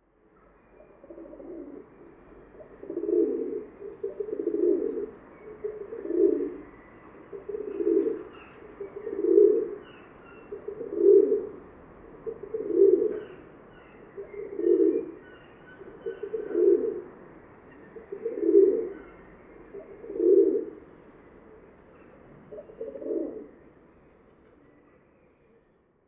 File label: Columbidae; کبوتر راعبی